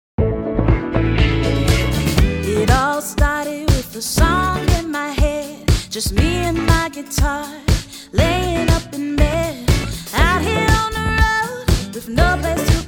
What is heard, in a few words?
Pop Country Sample